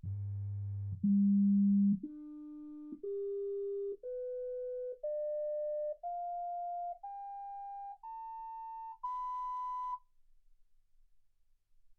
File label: sinus tones 48kHz